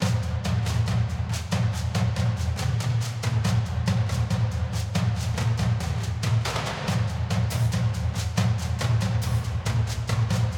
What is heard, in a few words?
perc; Sky Diving